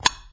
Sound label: block click; Junkbot